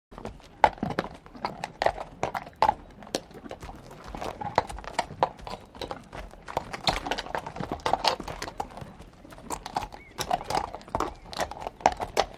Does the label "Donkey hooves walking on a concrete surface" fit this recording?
Yes